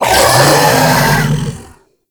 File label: Death Vocal; Enemies; Infested; Warframe; Femur